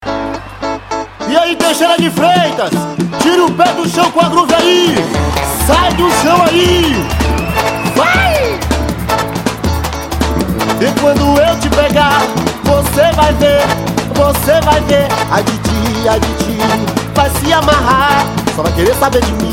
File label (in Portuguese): poutpourri axe; groovdop